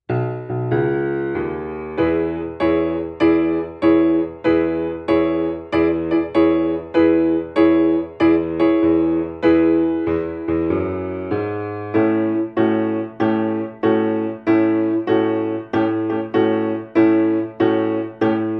Southside Blues swing 96

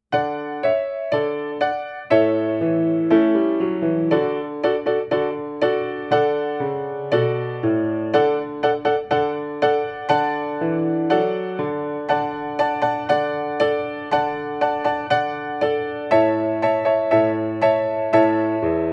The Royal Trumpets 120

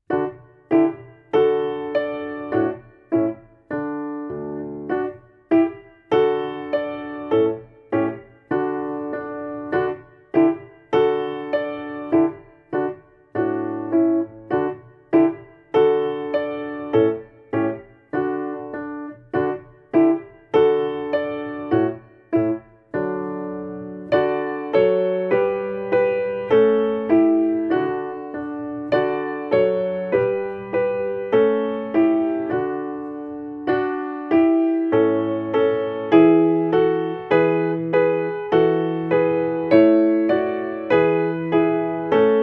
Gavotte, 100

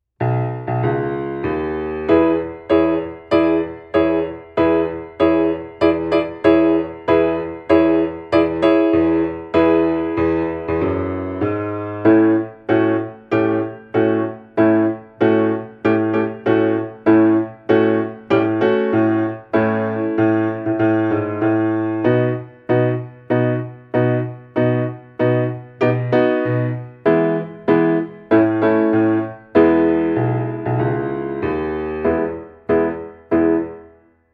Southside Blues, 96

